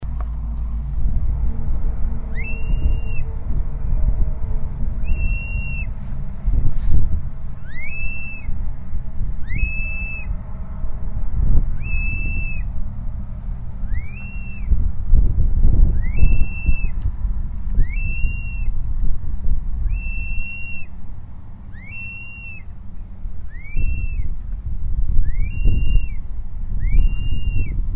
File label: black chested snake eagle